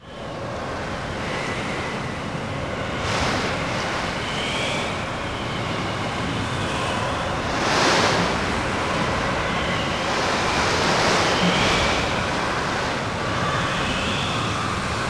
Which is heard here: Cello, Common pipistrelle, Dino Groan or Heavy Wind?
Heavy Wind